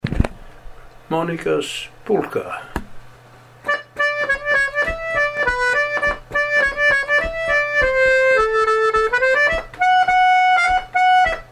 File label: polka